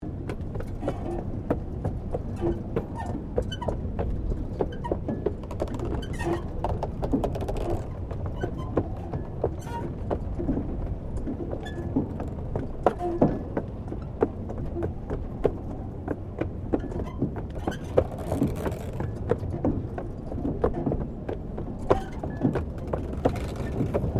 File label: Tram Horse Drawn Sequence Wooden Bridge; Horse drawn tramcar; Carriage Sound Effects; Carriages (Sound Effects:Vehicles)